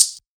Hat, Closed Hats

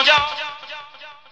"Myahh", Vox

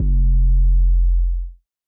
808's

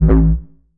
Sicko Growl; Cymatics; Astro FX 1

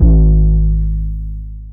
Hitter 808; 808s

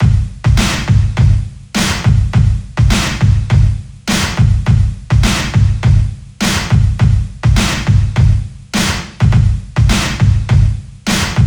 Drum Loop 103 bpm

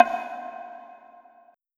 Snare

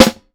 Live Snare

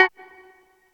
Guitar

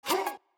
Chant

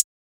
HH, Closed Hats

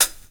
Closed Hats

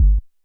hollow thump kick

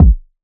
Kick

